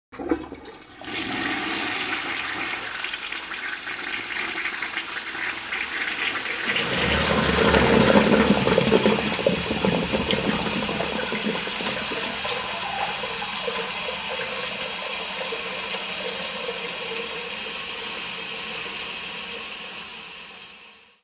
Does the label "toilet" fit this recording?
Yes